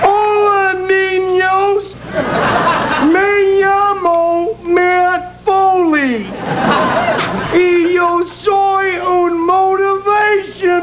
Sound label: foley